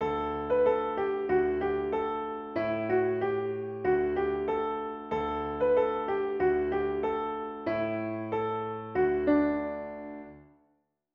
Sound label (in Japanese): ピアノ